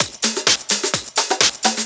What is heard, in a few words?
Discohouse Loop 128 BPM